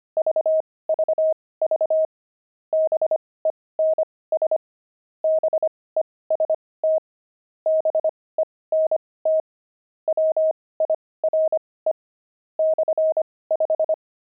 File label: pangram, intermediate pangrams files